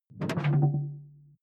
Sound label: sci-fi alien tech; swooshes-swishes